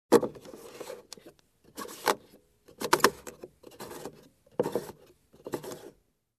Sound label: Stowing objects in car